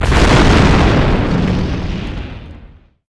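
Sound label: exp large generic, explosion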